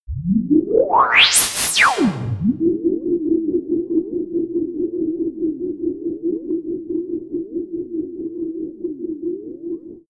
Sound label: wheezy pulse; bewegung; comedy-cartoon; soundpool